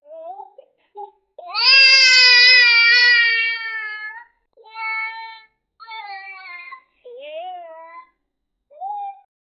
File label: child crying